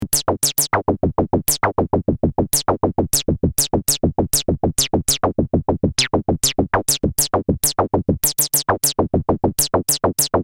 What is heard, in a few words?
VCF Show bip